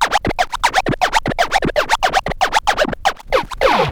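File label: SCRATCH SEQUENCES; AMG Megabass REMIX! VOL-2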